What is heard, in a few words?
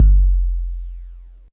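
bass drum 808 SP1200 F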